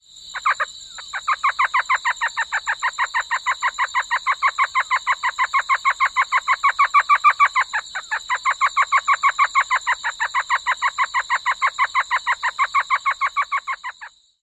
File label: Elf Owl continuous calling at nest